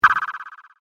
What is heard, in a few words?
Laser Quiver Beep, science fiction sound effect; Andrew Fleming